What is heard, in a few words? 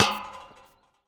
Street Ball Metal Pole Hit; SFX Kit